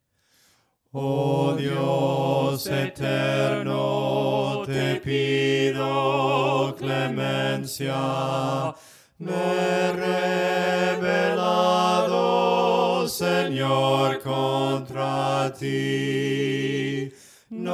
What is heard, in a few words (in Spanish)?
VOCES, himnos a capella; cantos del camino; OH DIOS ETERNO